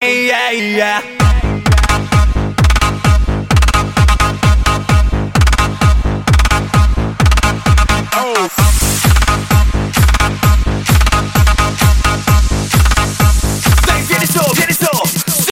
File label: eya eya background music tone ringtone